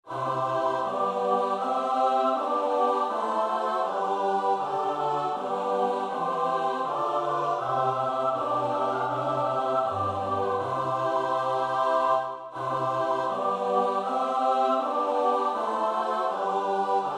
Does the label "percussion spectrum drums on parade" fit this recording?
No